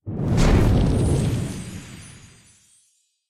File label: Campfire Unlock; gamerip; Beast Quest; ps4